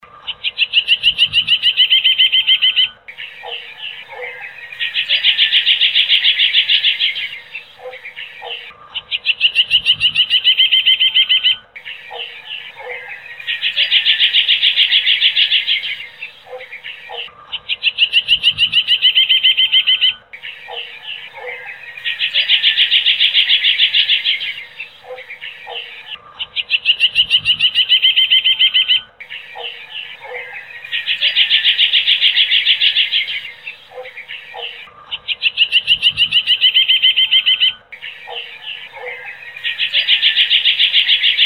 kicauan burung kapasan kemiri

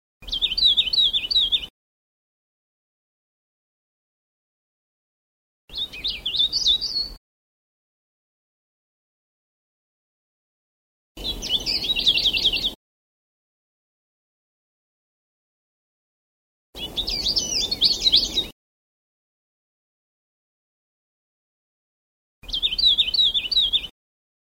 kicauan cikrak sulawesi

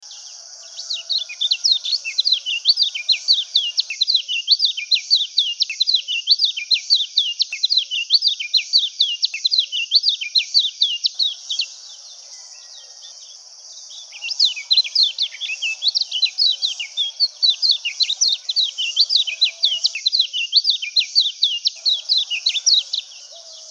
suara pleci laut

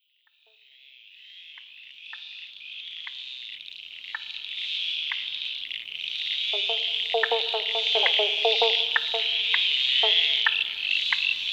katak pobblebonks marsh frogs dan crinias

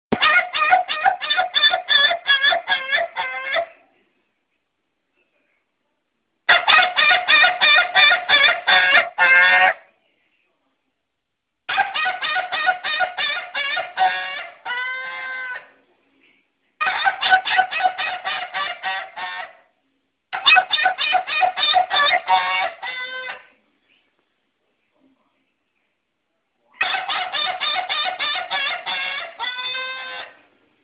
jenis bunyi ayam ketawa suara uniq

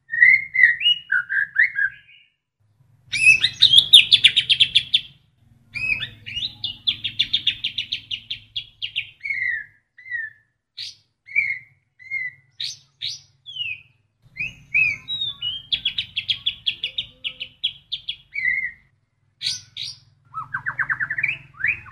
murai batu isian kapas tembak

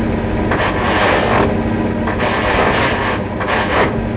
suara mobil

